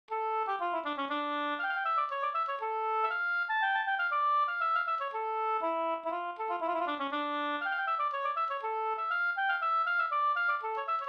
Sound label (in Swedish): polska Bingsjopolska brollopspolska; Pekkos Pers brollopspolska e Pekkos Helmer